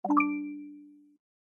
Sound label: sound success